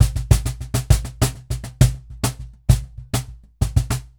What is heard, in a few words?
Cajon Loop 100BPM; musicradar